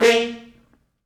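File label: French Horns stops, Quantum Leap Horns Sax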